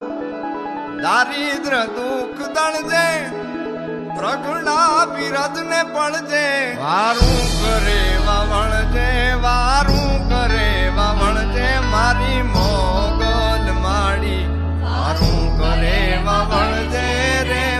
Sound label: Gujarati ringtone